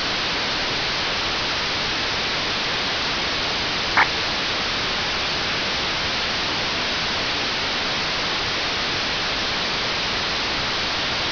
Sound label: FSK441 60ms pulse; 432mhz